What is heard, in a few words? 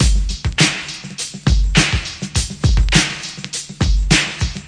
classic brk; DRUM LOOPS and BREAKS; VIBRIS CLASSIC BREAKS